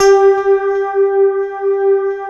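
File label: synthesizer, digital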